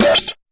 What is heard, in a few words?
fx drum, Electro FX